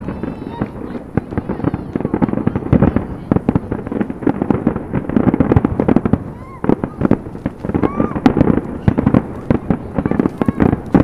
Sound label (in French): bouquet final